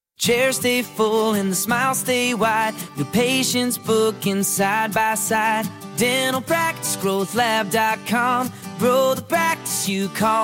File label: jingle